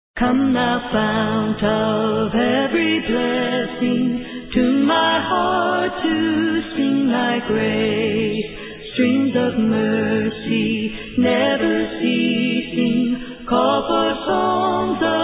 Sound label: a capella hymn; Come Thou Fount